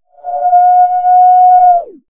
howl reverse real; Halloween

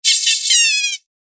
evil laughter real

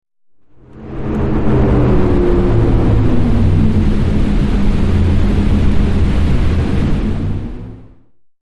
howl wind blowing real; Halloween

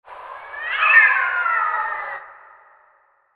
cry cats real; Halloween

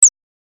lazer

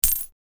clink coin dropped on top of each other real

